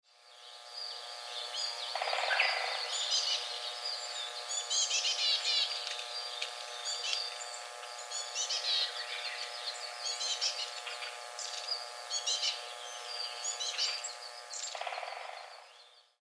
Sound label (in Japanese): kogara; shigakogen